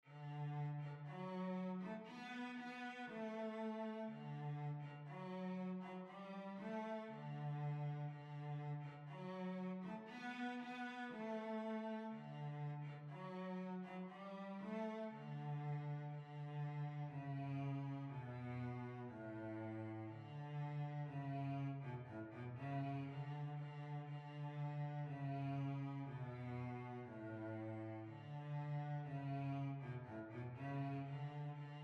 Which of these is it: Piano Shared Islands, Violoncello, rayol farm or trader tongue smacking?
Violoncello